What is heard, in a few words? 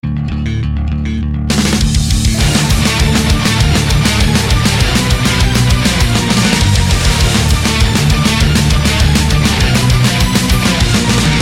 violon rock; ringtones